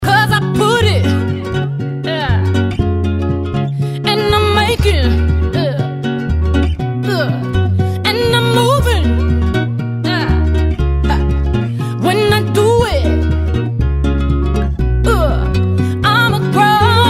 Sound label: acoustic version